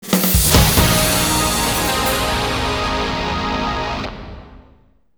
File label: win